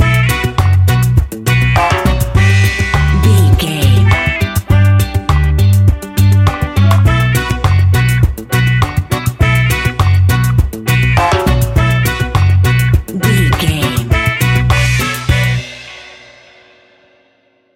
cool jamaican reggae island music, Classic reggae music with that skank bounce reggae feeling